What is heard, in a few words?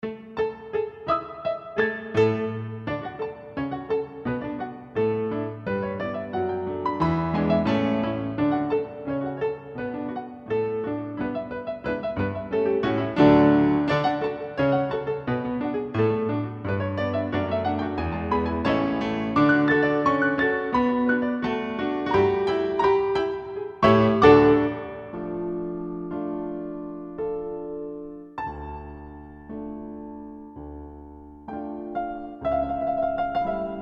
piano passion